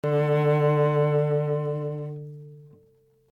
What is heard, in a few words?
cello